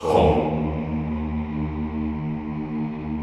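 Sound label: Vohmm Houuu, Chorus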